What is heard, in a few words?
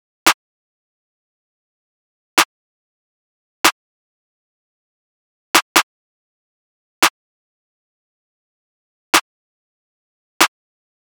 Drum Loop - 142 BPM Snare, Drill; Loop Stems; Cymatics; PARADOX II - Drum Loop Collection; Yearbook